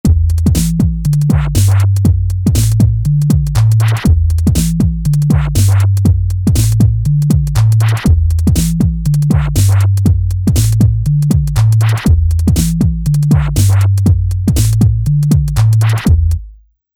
Rap Hip Hop

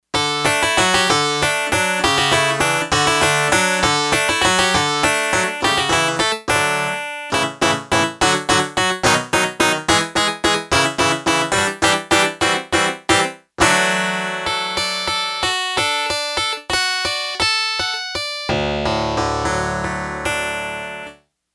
HarpsiBox, Yamaha TX802; Factory Patches; Voices

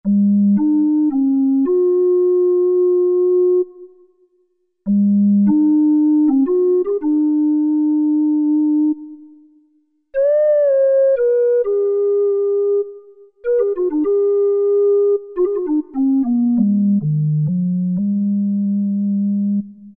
Analog Flute; Roland SR-JV80-11 Techno Collection

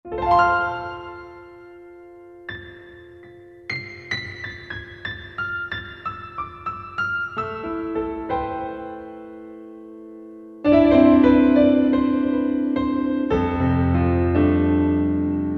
Empty Auditorium

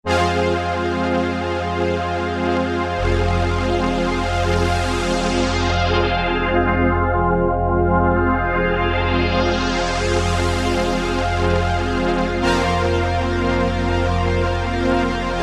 Filtered Mega-Pad, Korg PolySix; Tweaks

